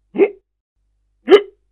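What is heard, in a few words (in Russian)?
звук икоты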